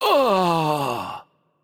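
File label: Romanian Death; bloom minigame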